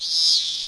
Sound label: bug